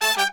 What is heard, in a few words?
100, BRASS SAMBA